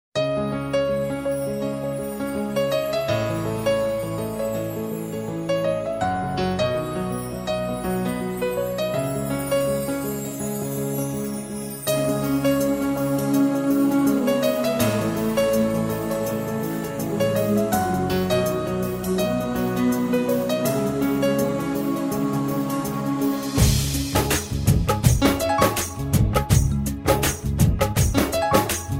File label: Tune